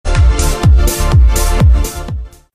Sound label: Volume of kick